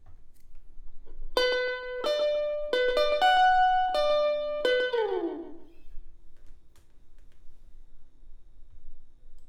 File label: Elect Guitar